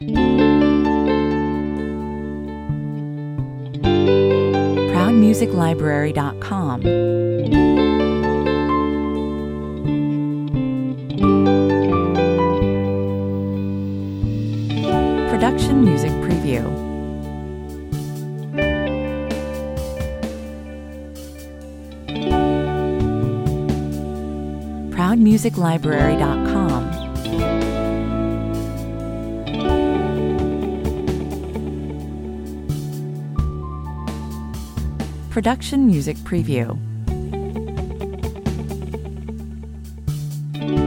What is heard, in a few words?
cold key 120 bpm